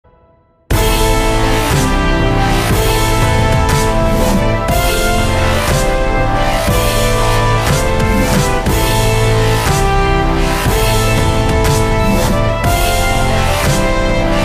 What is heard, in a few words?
dubstep remix; lord of the rings